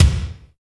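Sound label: massive kick; NATUR. K1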